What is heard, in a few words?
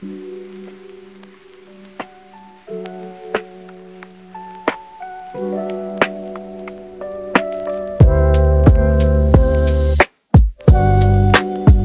Sexy